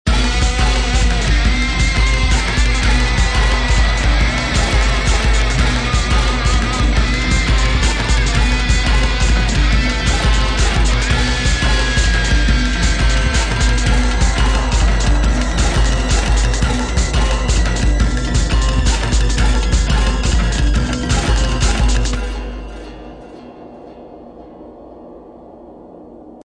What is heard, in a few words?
swamp - suspense